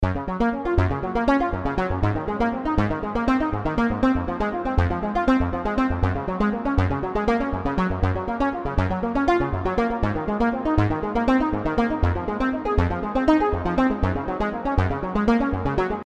unstable arpeggiator, yamaha cs 80 analog synthesizer